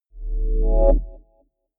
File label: chord swell short